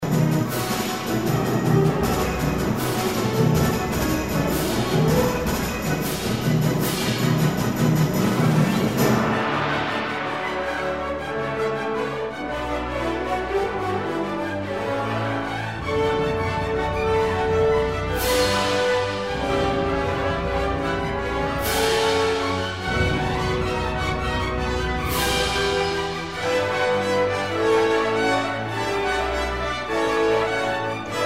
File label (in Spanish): percu tutti